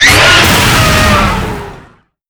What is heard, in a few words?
Grunt Die; netent